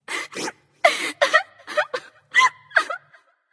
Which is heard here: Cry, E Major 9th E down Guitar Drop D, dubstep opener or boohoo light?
boohoo light